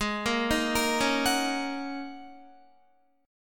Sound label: Ab 7th Suspended 2nd Suspended 4th, Guitar, 4E,4A,5D,5G,5C,6F; 16,13,13,15,13,13; arpeggiated